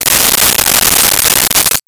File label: Keys shuffling, Foley